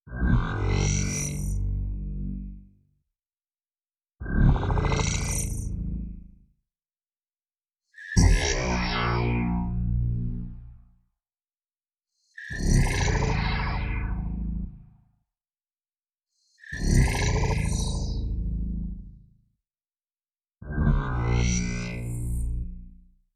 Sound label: Periodic Cosmic High